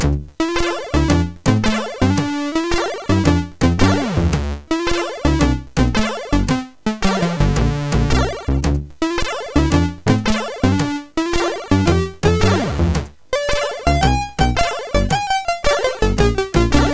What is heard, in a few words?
Funk Theme, sid MOS6581R4; Evony